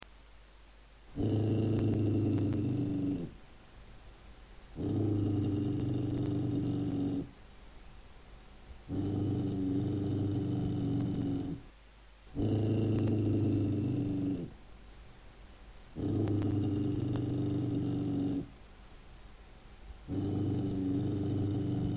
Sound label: threatening growl